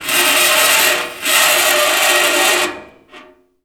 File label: metal grinding